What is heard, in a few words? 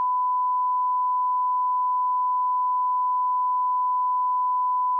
ALERT TONE, Dispatch